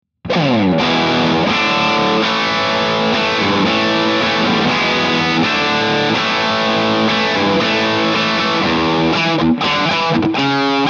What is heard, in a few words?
characteristic sound; jensentone